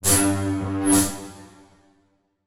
Transition Cymbal Element; Production Element Title Transition

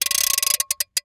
Foley Toy Noisemaker Crank Slow

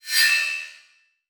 Multimedia Internet CD-Rom Flash Element

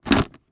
Sports Bag Pick Up Bowling Bag Leather

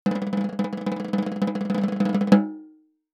Cartoon Drum Roll Tom

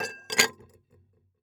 Dishes Stack Ceramic; household

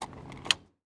Transportation Gas Cap Removal

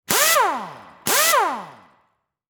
Industry Tool Air Gun Run Multiple Close

